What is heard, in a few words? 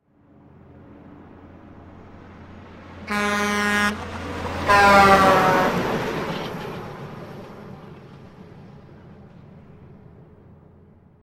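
Doppler horn drive-by left to right semi-truck; Cars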